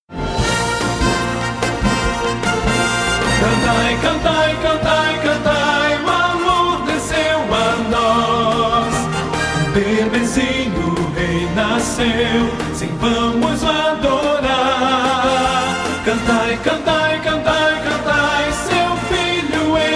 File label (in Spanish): Cantad Cantad Cantad Tenor; cantata navidena